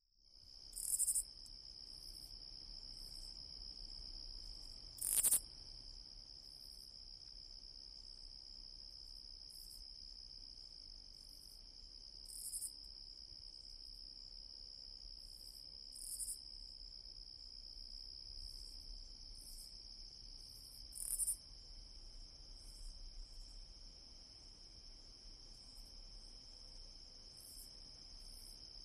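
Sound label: KATYDID VERY close up OVER CONTINUOUS background; wildlife sounds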